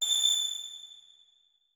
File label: notes, keyboard; Hideout Furniture